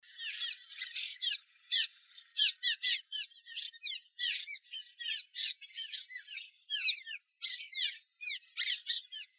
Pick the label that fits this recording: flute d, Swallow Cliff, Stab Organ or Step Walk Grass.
Swallow Cliff